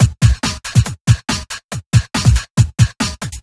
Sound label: Breakbeat; Vengeance Samples; VEC2 Loops